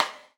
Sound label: GATE RIM, Drums Acoustic; USB Soundscan vol.10; AKAI; 90s sample CD; GATEKIT 1